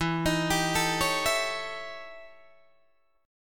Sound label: E Minor Major 13th E Guitar DADGAD; EmM13; arpeggiated